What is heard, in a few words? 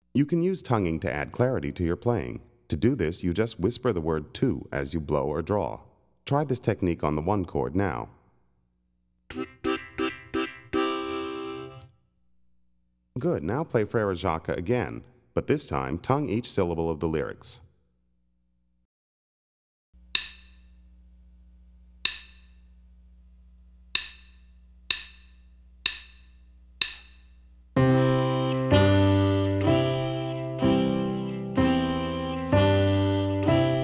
Tonguing, harmonica; Play Harmonica